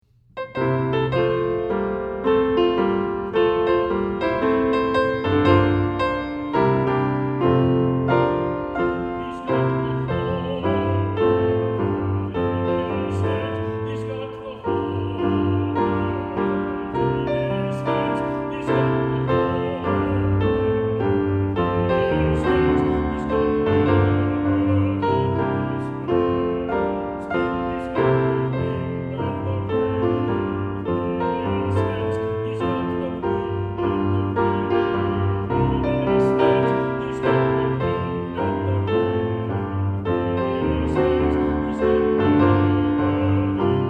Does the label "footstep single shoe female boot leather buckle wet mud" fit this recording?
No